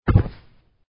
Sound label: drop